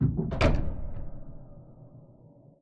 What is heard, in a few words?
cradle rock; Cozypaw-Hospital